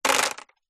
household necklace small stones pebbles set down plastic surface